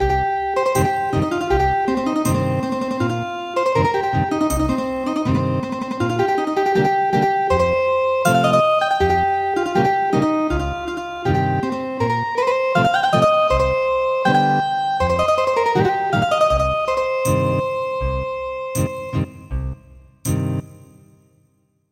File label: Ethnic Guitar; Gold cup